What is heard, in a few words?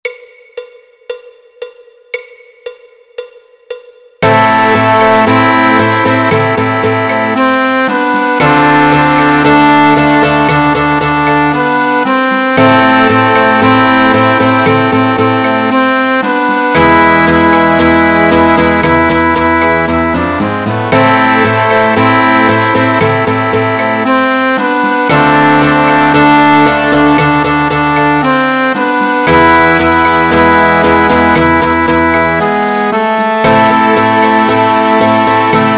Latin Groove Backing Track All; Full orchestra